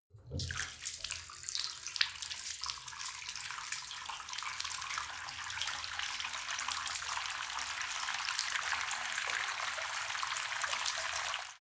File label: bathtub running water; haus garten; badezimmer